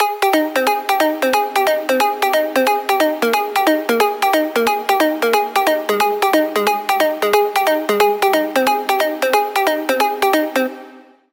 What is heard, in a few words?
Pluck, Sylenth1; Routed Sound; Sylenth1 Expansion - Star Scavenger Vol.1